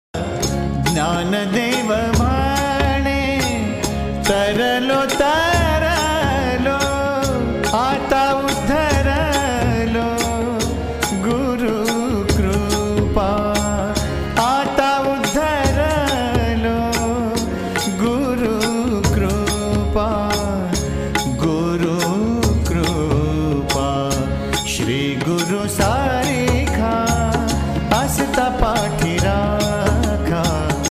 Marathi ringtone aarti ringtone; Vitthal; devachi ringtone

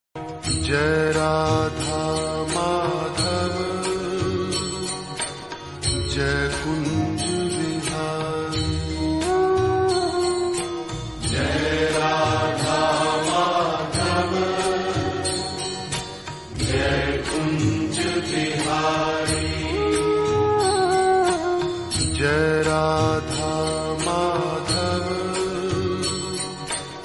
krishna bhajan ringtone; jai radha madhav jai kunj bihari

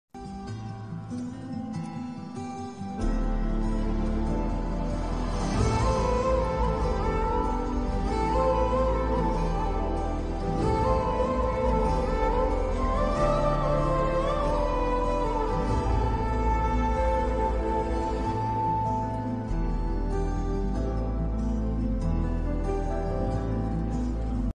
emotional bgm ringtone; saba nayagan movie

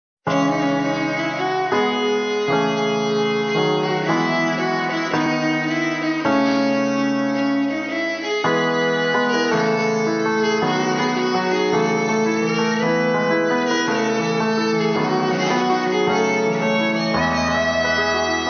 bgm violin cover; raja

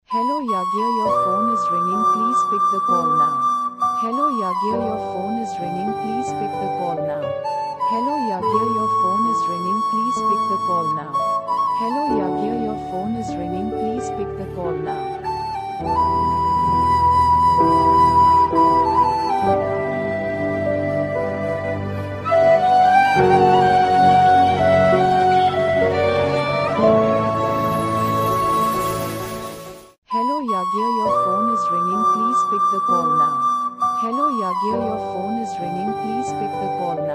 name ringtone; iphone

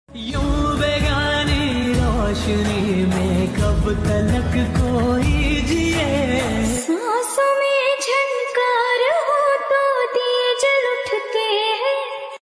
song ringtone, love song ringtone, lofi

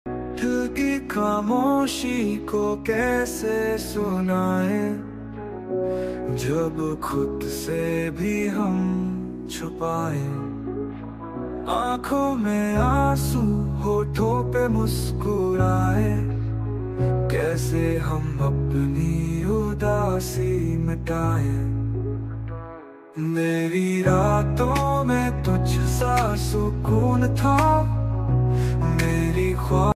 Hindi Ringtone Ringtone Song Love Ringtone

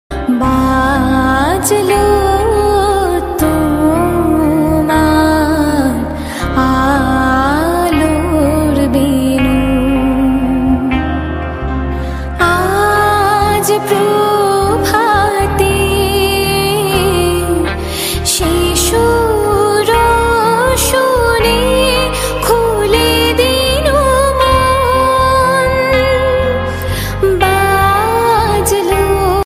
Bengali ringtone, new trending Bengali music

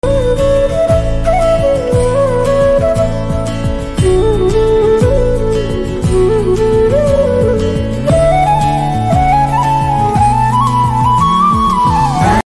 flute ringtone

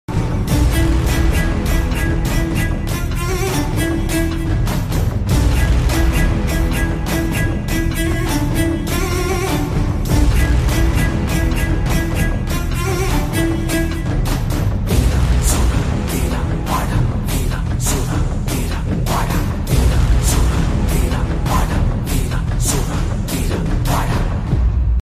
naane varuven interval bgm ringtone

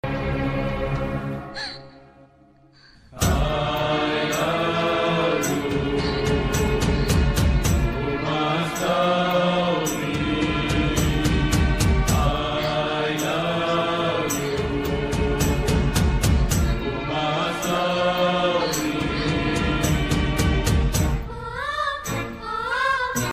kannada bgm ringtone; om; shivarajkumar

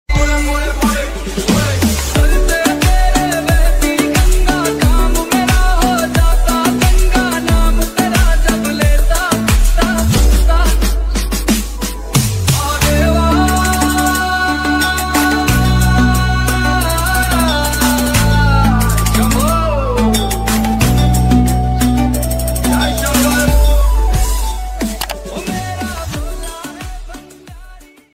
mahadev ringtone bholenath bhakti ringtone

